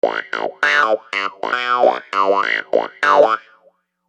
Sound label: Phunky Wah Clav; Construction Kit; HIP-HOP; Sonic Foundry (Sony Creative Software)